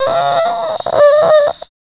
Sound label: VOICE FX, Dance Vocals; Best Service ProSamples vol.12; AKAI